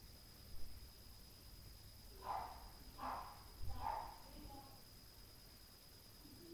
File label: DOG NOISE Stree or Floor, Night Noise